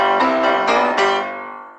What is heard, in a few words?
goofy piano riff; goofy ahh